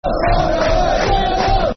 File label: Barmy Army, Football Chants; Barmy Army; Sheffield Wednesday Swfc